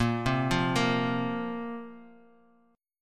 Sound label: Bb Suspended 2nd Bb down; Bbsus2 arpeggiated